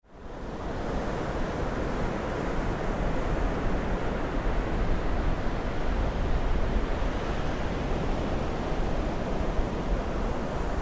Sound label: Ocean